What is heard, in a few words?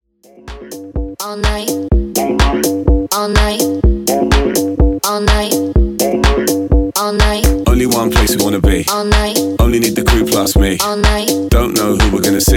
house, 125 bpm